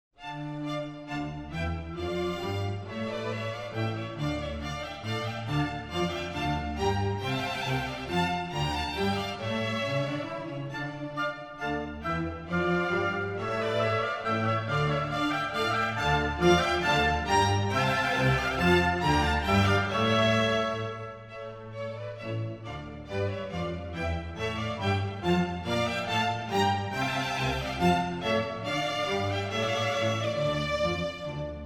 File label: Music for the Royal Fireworks - Menuet I; HANDEL, Georg Frideric; The Water Music Suites